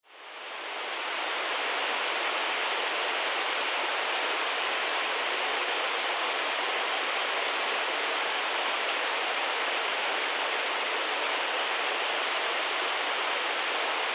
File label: forest binaural; alishan